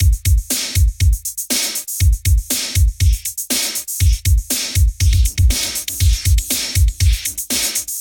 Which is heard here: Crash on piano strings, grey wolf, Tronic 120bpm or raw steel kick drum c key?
Tronic 120bpm